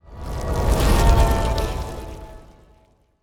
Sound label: ant ship transport move; Stellaris; biogenesis; ships